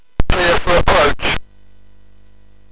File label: Jump Jet, sid MOS6581R4; hvsc